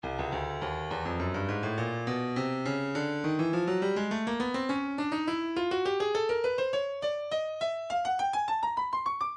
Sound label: breakbeat 103 bpm; maximus b; Stylus Breakbeat Midi Files